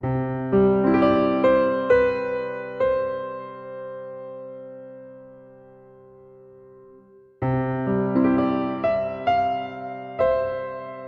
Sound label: piano light loop; linux macos ps vita ps4 ps5 switch windows gamerip 2019; Drowning